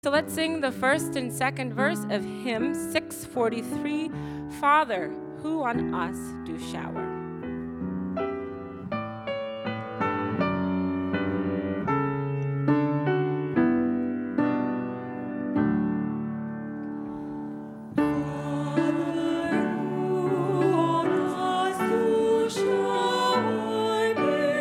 Father Who on Us Do Shower, SDA Hymns, Sabbath School Choir; Stanzas 1 and 2